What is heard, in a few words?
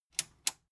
metal button tiny click enable slow